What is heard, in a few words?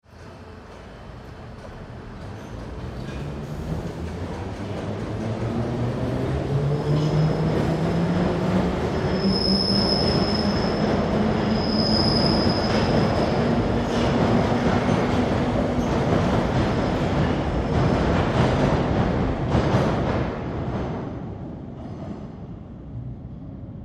Train Subway Underground Start and away